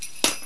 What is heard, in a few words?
fall; frosty